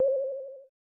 Item Chime; Krusty Mug

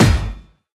Juggernaut Punch

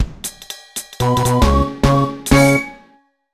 Level Clear! (1st Floor Fanfare); mario vs. donkey kong 2 march of the minis; mario vs. donkey kong 2 minimini daikoushin; nintendo ds

